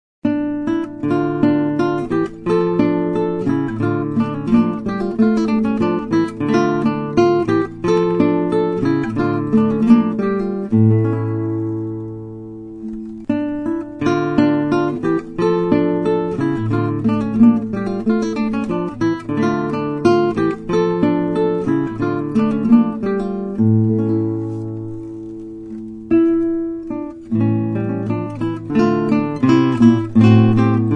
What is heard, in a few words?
bourree, guitar; visee; Suite